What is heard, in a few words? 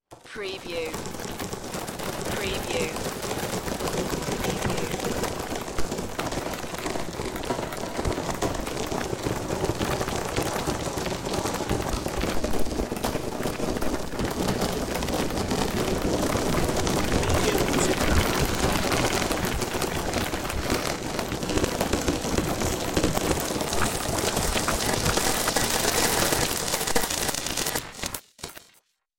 crawling alien bugs